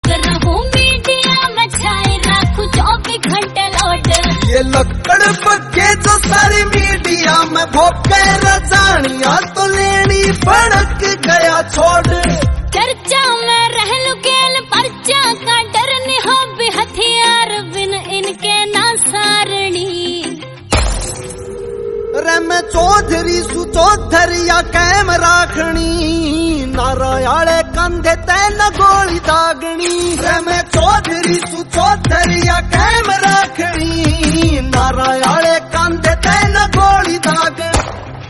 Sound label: Haryanvi Song